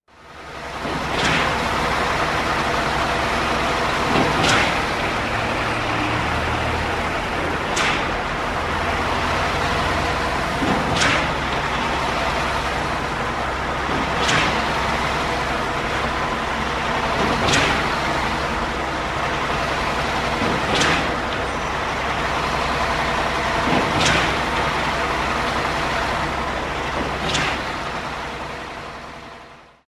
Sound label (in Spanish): calles montevideo y p roca maquina hormigonera